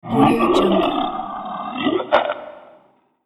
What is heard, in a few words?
dragon like beast